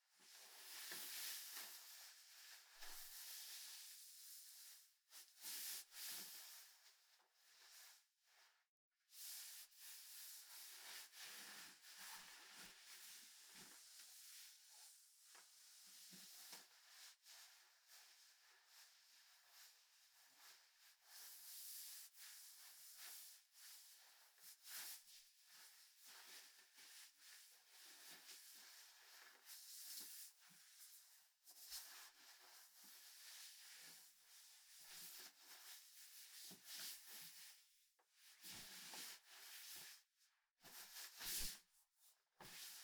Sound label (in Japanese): まさぐる衣擦れ; 3DIO; アダルトな音